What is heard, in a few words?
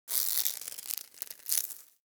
Tendon Stretch Small Close; Ambience; Warframe; Infested Eris